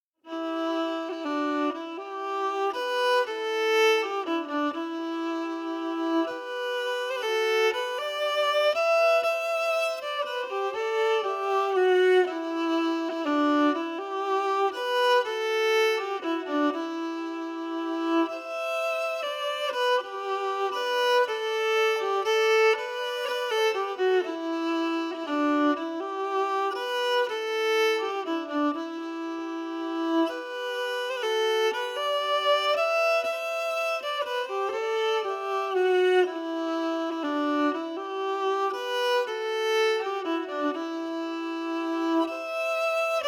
Waltz melody emphasized; Magic Waltz

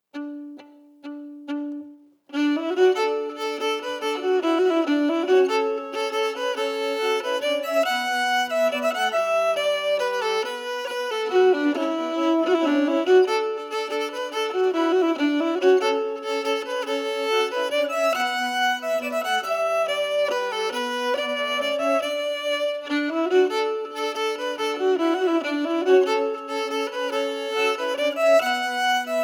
melody emphasized

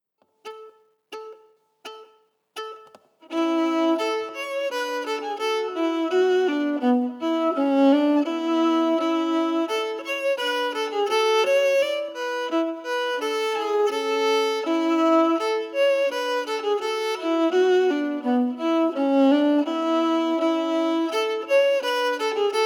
melody emphasized

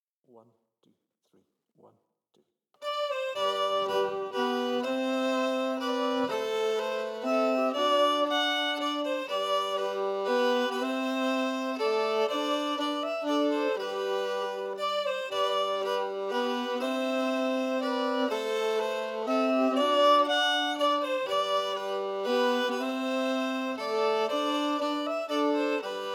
Merch harmonies emphasized

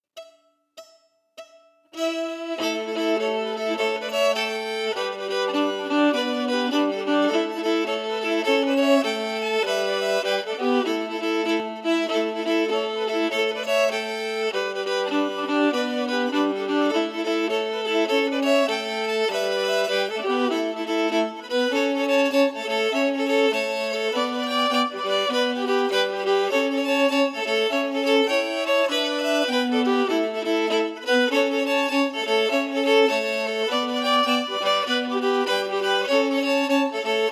The Weaver harmonies emphasized

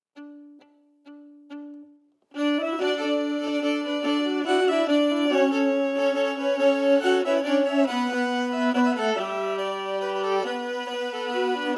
harmonies emphasized

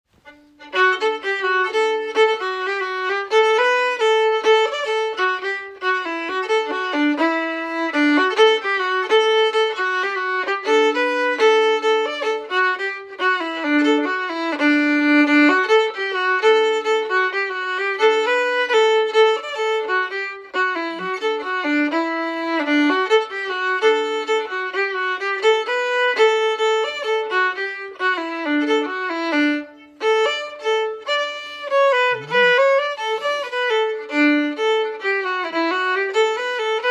schottishe fiddle; Schottishe a Virmoux; crown russe

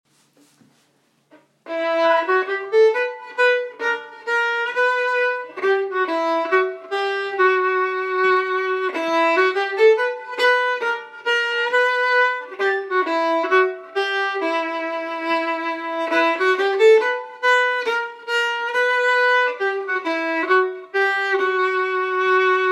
Carpathian Tune

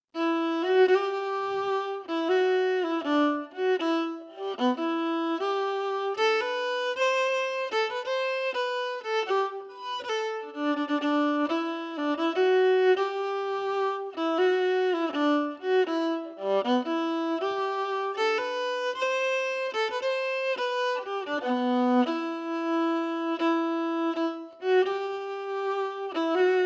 Grand Etang slow

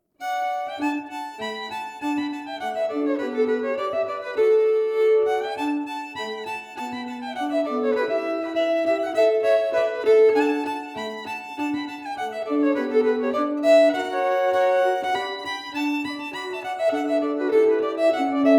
Old Parnell Reel melody focused

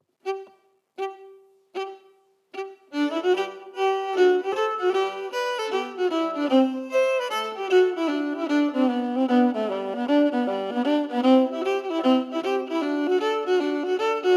Westward Willa melody emphasized

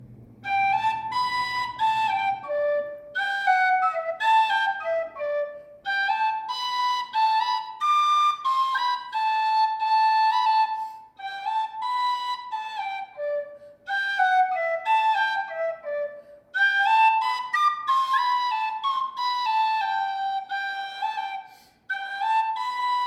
on Whistle; Kelso; kelso accordion and fiddle club

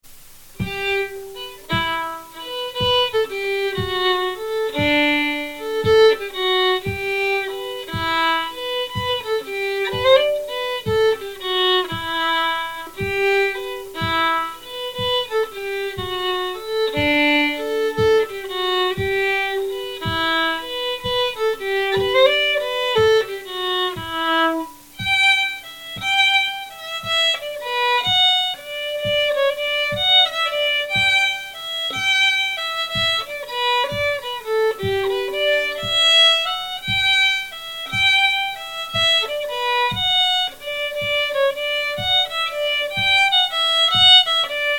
A Fig for a Kiss Slip Jig

